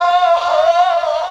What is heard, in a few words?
"oh ho", sung; Voice